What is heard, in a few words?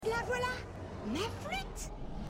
flute